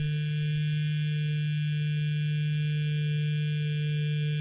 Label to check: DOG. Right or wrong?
Wrong